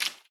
water footstep

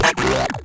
machine

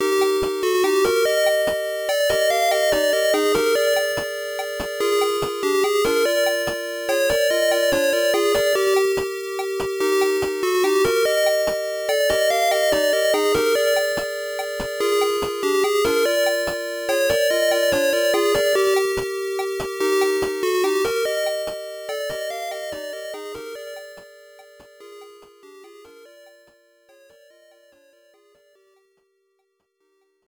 Balloon BGM; Pitfall II Lost Caverns; System 1; arcade

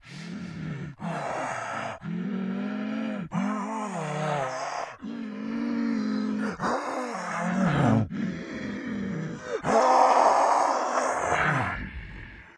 chase loop demonic

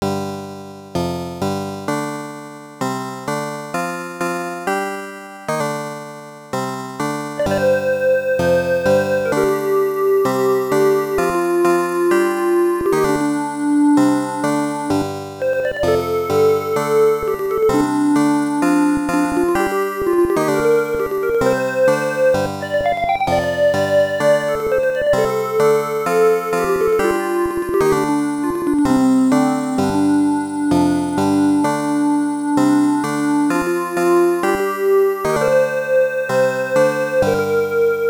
BGM

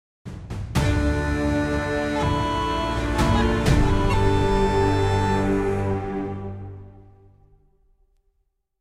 Celtic Death; Smite

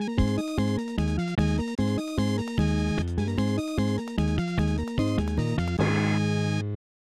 Unknown Jingle; Hardball 3; SNES

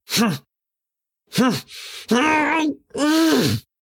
Monster Spawn; Mouthless; Devour 2021 gamerip pc macos

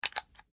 Reload Ammo

